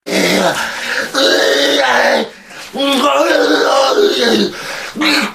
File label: hurr